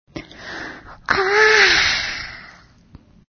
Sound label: yawning; Dora